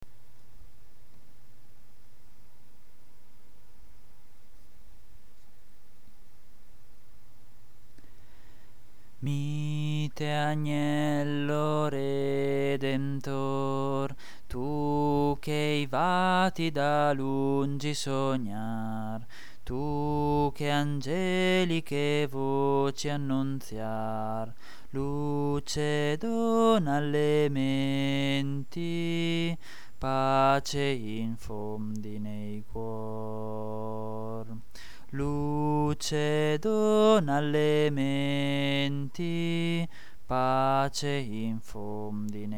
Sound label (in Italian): voce contralti; astro del ciel